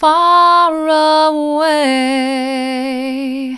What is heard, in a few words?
faraway mid, vocal